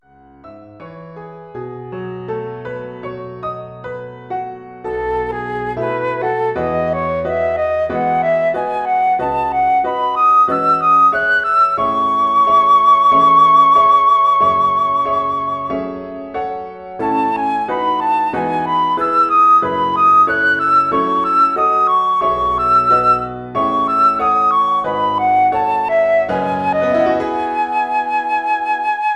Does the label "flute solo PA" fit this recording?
Yes